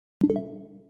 nintendo switch home sound

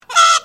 squawk

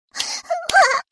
waa uwu

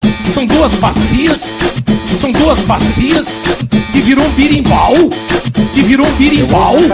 birimbau

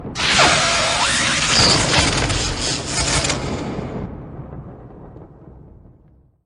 lightning short

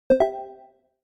discord user joined vc activity

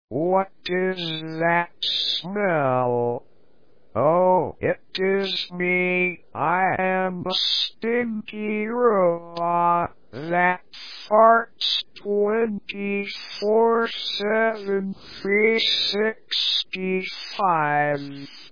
farting; bonzibuddy

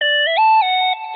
iphone noise

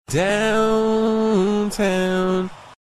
autotune; chris smoove

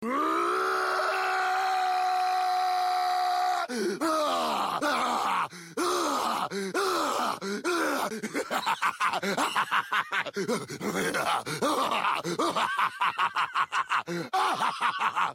brick

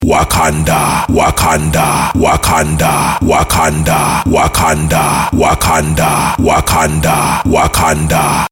loop

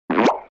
cartoon pop

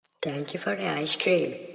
ice scream

